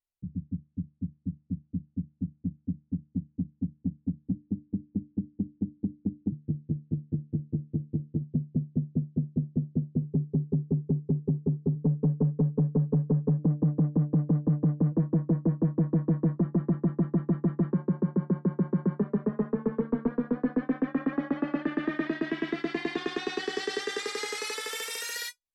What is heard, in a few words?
Moog tonal jumps